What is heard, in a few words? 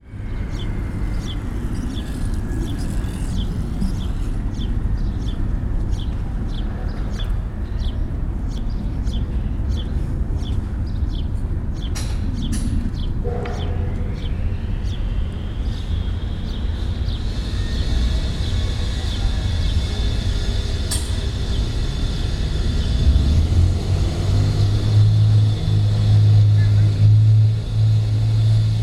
Construction